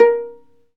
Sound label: STR VLA PI, Chamber Pizz; Roland L-CD702